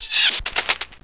arrow; Exploring Windows 95: Your Complete Training Guide

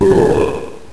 Blocker Death; AmoebArena

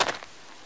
Gravel; The Whell of Time; wotdemo; Revista CD Expert 33

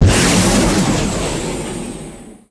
warp enter; Earth and Beyond; EA Games

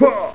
woah

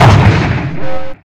checkpoint; Ballist

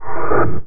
click

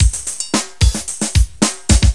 D-Kit

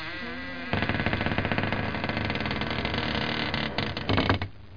big door

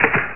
drop gun

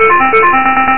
congrat; Black Box 1.2.3

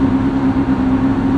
Low Lp, Engines; SuperBike2001

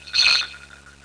shfflbd, parks; WAV Sound Effects

